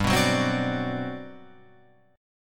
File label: G Major 11th, Guitar Open F; strummed; JGuitar